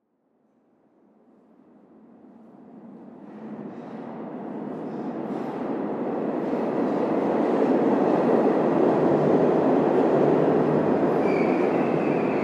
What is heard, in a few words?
Subway Pass By, Subway Pass By Distant; Transportation; Subway Sound Effects; Subways (Sound Effects:Vehicles); Blastwave